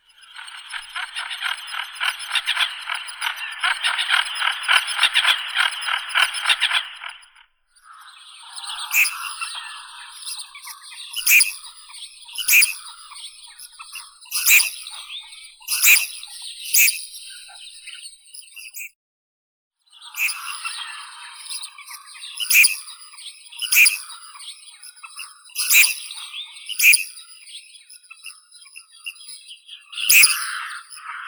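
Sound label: red-crested pochard, Netta rufina; nirok krasnonosii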